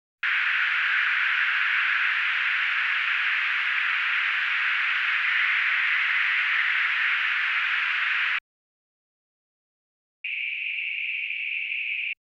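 1.6khz, 2khz, 2.5khz -20/-16db L/R [L 1/3 octave Band Noise, R 1/1 octave Band Noise]; Denon Audio Technical CD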